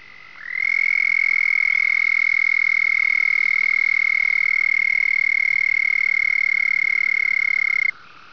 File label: texas toad call; amphibian watch; texas nature trackers